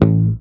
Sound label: Bass, MUTES